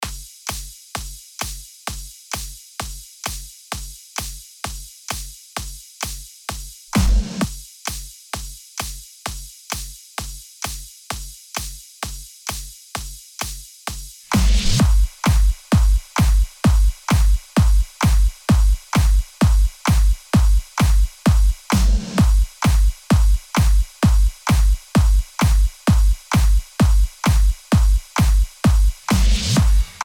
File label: Drum FX